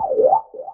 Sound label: menu back; [EZ] Ekoro blue